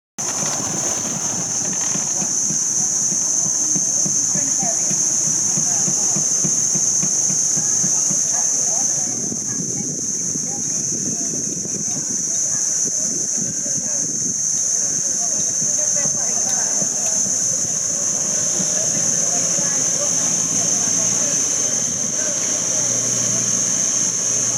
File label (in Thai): เสียงบรรยากาศ, หาดนพรัตน์ธารา กระบี่